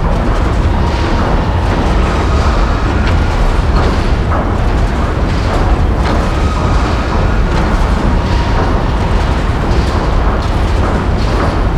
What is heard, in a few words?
Gear Loop; moul